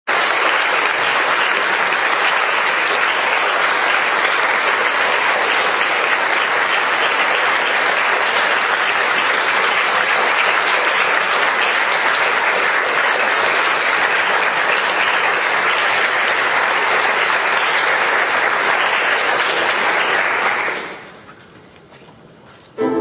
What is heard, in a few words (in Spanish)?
aplausos meme sound effect